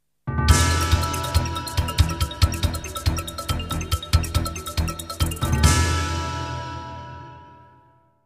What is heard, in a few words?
jingles; 90 DDD Jingles